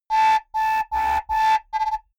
typewriter sequence

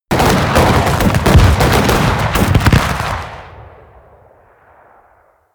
volley large group close

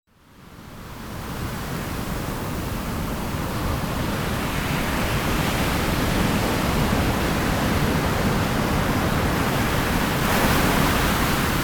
waves breaking

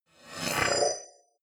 flyby splutter

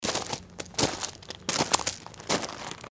steps on stony path

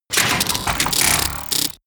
robot transformation metal

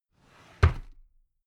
drawer cabinet carton close heavy halfway

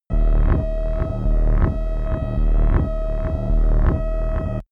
sci-fi alien enemy

